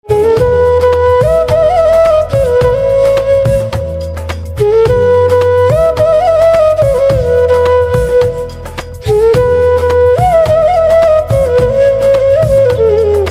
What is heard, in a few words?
Flute Instrumental Ringtone; rani mukherjee